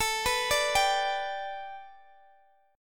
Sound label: A 7th Suspended 2nd Suspended 4th A Guitar DADGAD, chord